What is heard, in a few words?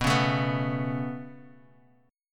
B 7th Suspended 2nd; B7sus2; strummed; JGuitar